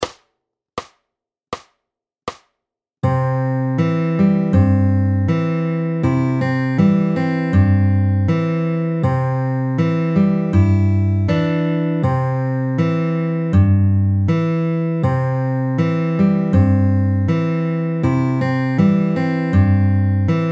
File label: In Betweens half quarter SLOW, travis picking